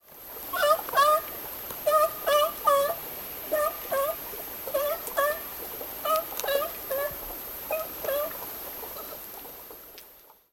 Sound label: sealion